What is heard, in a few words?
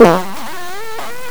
fart